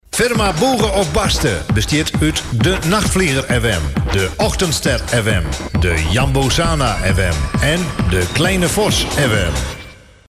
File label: Jingle; Audio Fragmenten; Arkan Radio 2